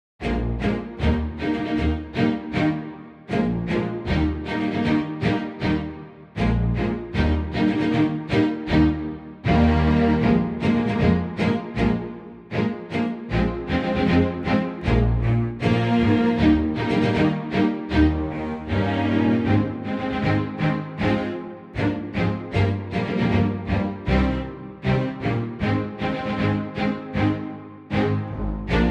Eb strings only; chamber strings

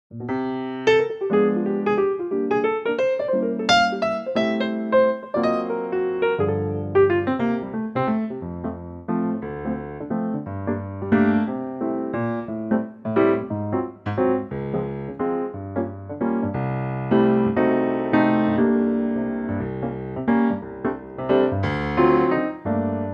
F piano

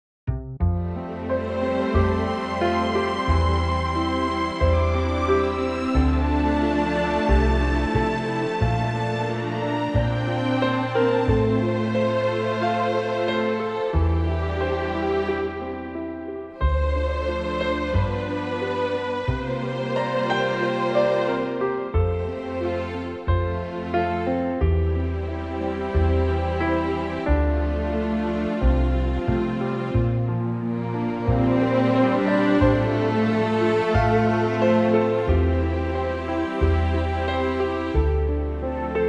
When I Dream F orchestral

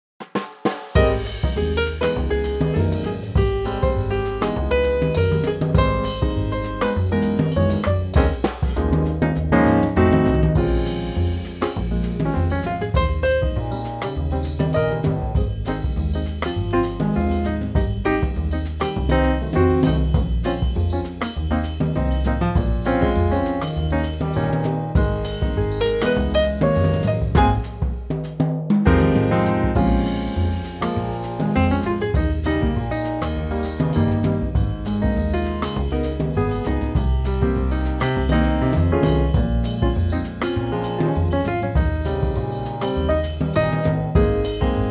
Ab Trio

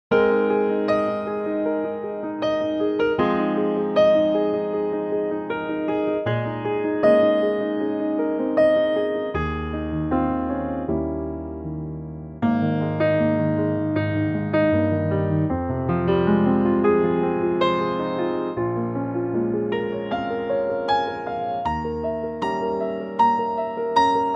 piano

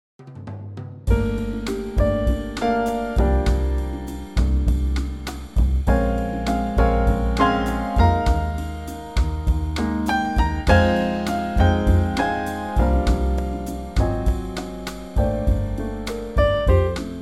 Gm Bossa Trio 100bpm

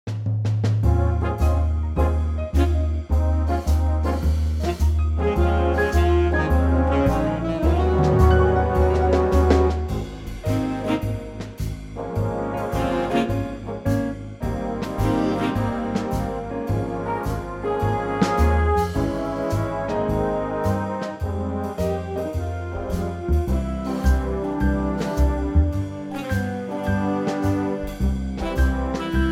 Big Band Ab

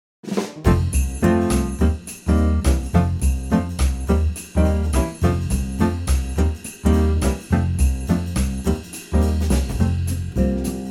Quartet F, christmas tracks

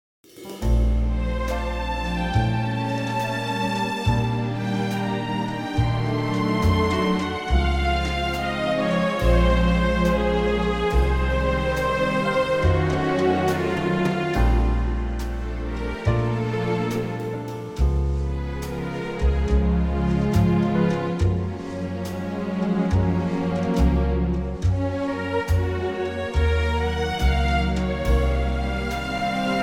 Bb orchestral

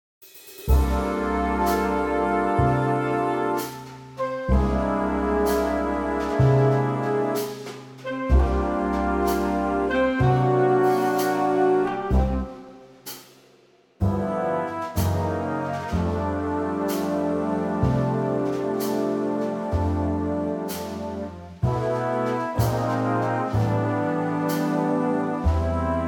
big band F

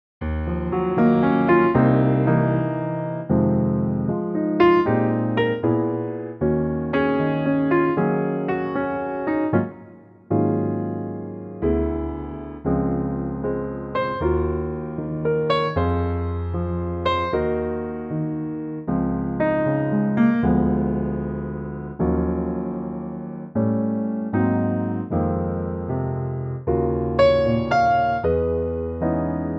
Db piano

